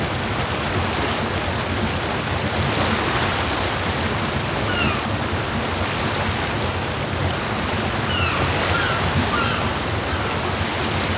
sea day; titanic

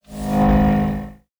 sentry scan; buildable

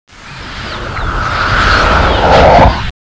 tower canyon right; ambience

